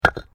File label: PAVING STONE FALL; Material components for constructing more complex sounds; Drop Sound Effects; Drops (Sound Effects:Objects)